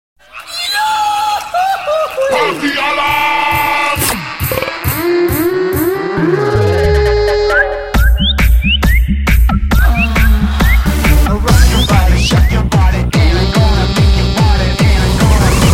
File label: Party Alarm Mix